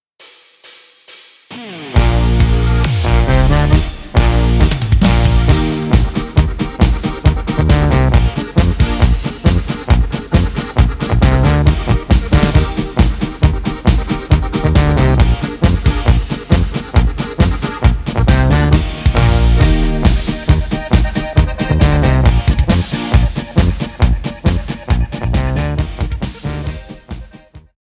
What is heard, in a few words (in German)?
Trompeten Echo